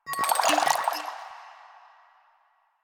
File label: Vial Foley; Warframe; Lasrian; Lasrian Soldiers; Footsteps; Lasrian Goonadier